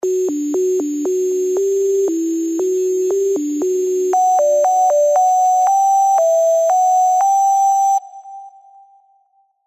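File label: Spire, keys; Half Life; alonso happy holidays 2021 spire soundset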